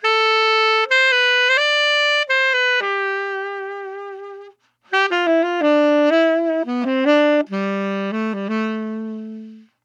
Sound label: Saxophone 85bpm Am Chill Smooth